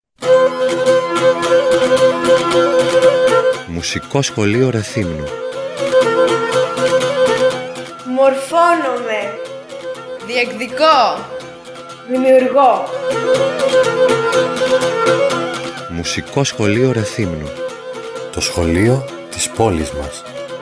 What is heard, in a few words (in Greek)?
spot mousik